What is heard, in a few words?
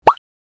cartoon style pop sound, bubbly